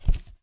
Heavy Book Drop; Phasmophobia

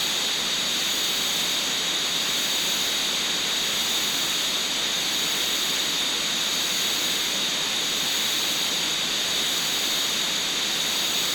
Static; Phasmophobia

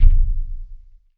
IMPACT Metal Deep Smooth mono; Phasmophobia

